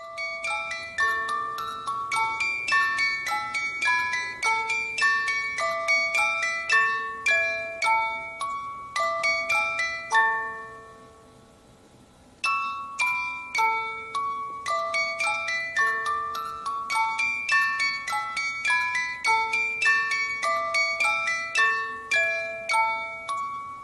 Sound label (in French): boite musique; voilier et dauphins; enfants; memoiresdoceans